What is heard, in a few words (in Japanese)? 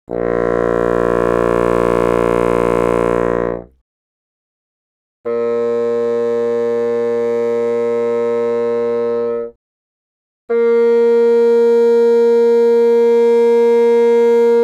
ファゴット, Double Reed; 気鳴楽器